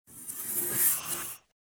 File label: Orb Respawn; Munin; macOS; Windows